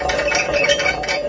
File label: wheel sound; lego star wars